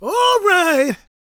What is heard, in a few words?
gospel male, vocal; ILIO; Vocal Planet